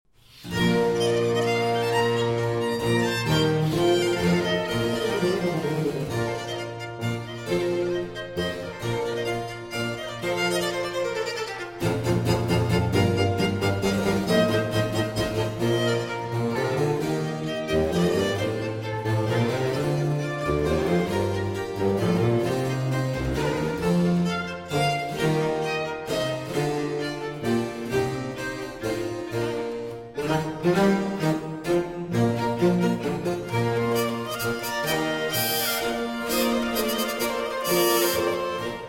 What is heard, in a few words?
Concerto For Hurdy-Gurdy, 2 Violins and Basso Continuo in C Major; III. Allegro